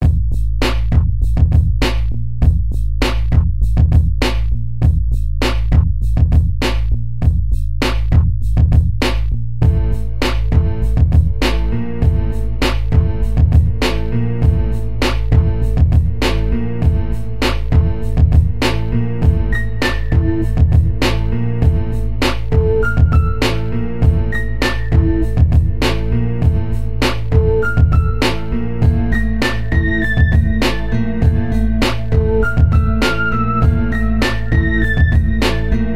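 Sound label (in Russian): бас bass объемный 3d звук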